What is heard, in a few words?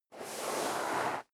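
step sneaker sand slide long, footsteps; schritte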